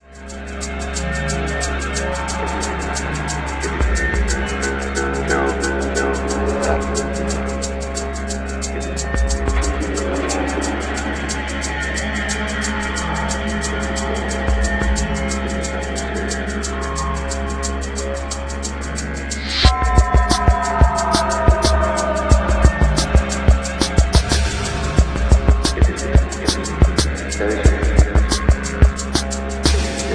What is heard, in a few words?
escape, ambient